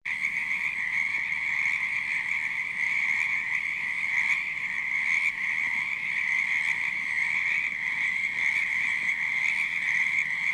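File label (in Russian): лягушки квакают ночью на фоне цикады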